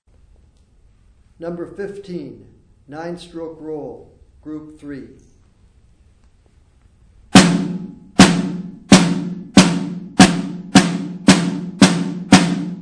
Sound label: snare rudiment